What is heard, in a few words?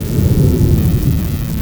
laser burn